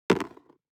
DICE on Cardboard, Throw and Roll, Metal, Two Dice; Samples; ArticulatedSounds; DICE Sfx Party (Extended)